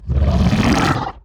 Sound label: mother anger shake; finalmother